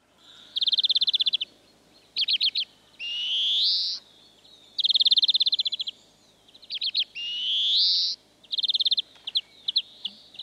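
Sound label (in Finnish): viherpeippo, lintuäänet; Laulu sointuva ”djydjydjy…”, jonka katkaisee välillä tyypillinen ryystäävä ”dsryyy”; suosii kulttuuriympäristöjä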